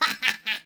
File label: "hahaha"; jocly